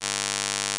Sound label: alternating pulse train